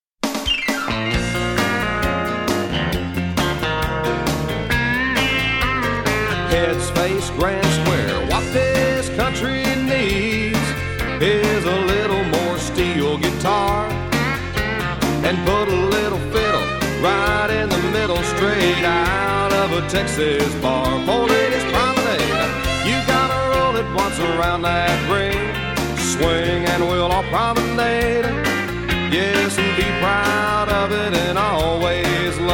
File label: what this country needs vocal